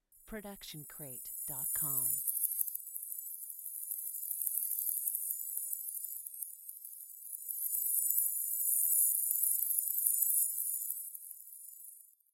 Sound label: Magical Portal Shimmer; SoundsCrate